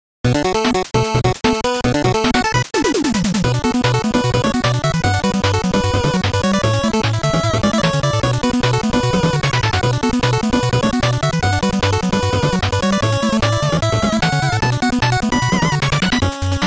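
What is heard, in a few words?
FM synth music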